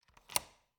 Cabin, front door, deadbolt, lock, latch, thick, close, hyper; Eiravaein Works; Vaeyan IV